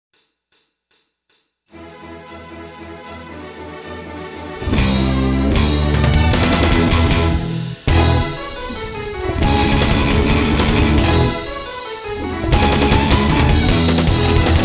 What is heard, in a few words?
Guitar Minus Track